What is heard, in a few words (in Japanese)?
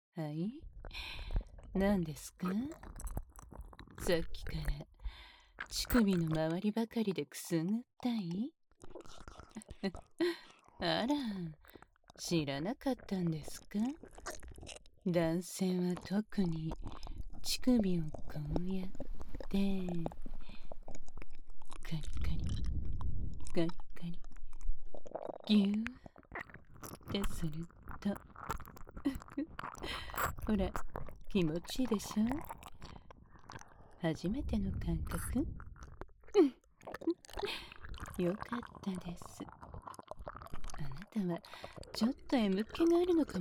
耳舐め 乳首舐め 手コキ フェラ される音声